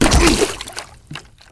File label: Hit surfer